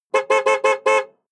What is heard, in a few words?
sports car ext horn rhythms